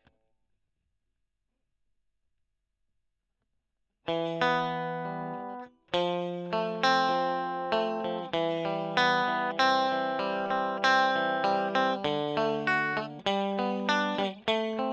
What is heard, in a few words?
E.GTR; Gefell